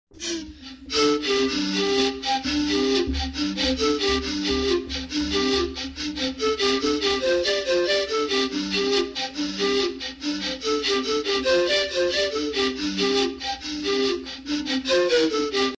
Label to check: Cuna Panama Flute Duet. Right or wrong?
Right